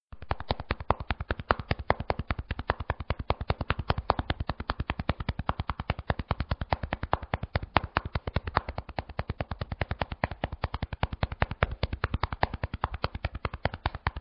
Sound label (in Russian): beg mulitiashnyi; Смешные и комичные звуковые эффекты для детских видео